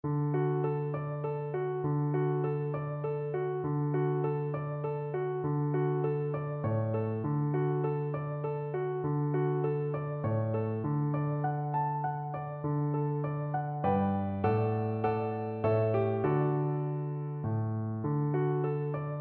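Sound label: viola karaoke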